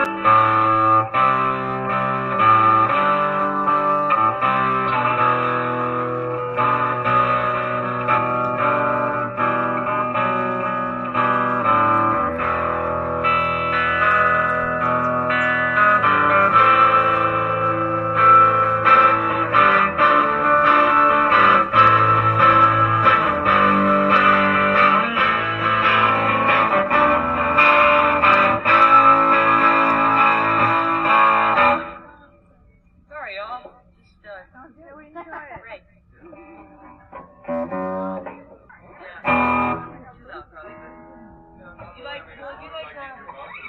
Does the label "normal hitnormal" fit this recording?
No